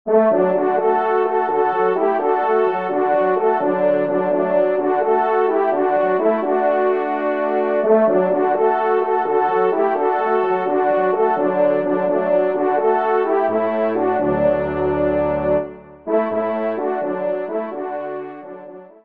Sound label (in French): trompe; Hardy Berry; Pepin Lehalleur